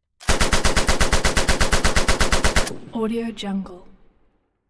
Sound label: gun shot madsen machine type fire